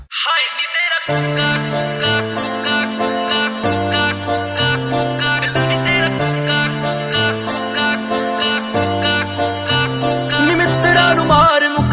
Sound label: New Punjabi Song Ringtone